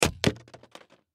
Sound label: Plastic Package, Plastic Package Drop; Drops; Foley; Blastwave